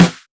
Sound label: Snare 80s; One Shots; Drums; Ableton Live 12 Lite; Core Library